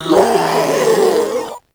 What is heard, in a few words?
Strike Scream, Proto Infested Ancient; Warframe; Abilities